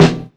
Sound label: short snare e key; snare drum samples; drum single hits one shots